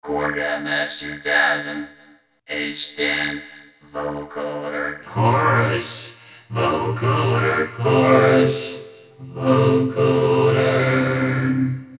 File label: Vocoder Cho, Korg MS2000; Factory Patches